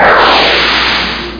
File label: swoosh